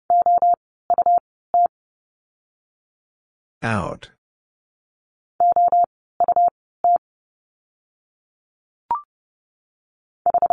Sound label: Letter x - Words 30wpm, morsecode